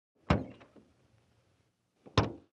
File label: VEHICLES - AUTO ACCESSORIES: Old automobile door open and close.; accessories doors